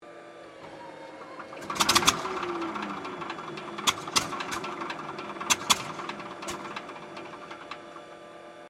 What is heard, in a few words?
Aircraft P-40 Kittyhawk Startup miss-Fire, WW2 Kittyhawk or Warhawk fighter plane; 1940s; Airplanes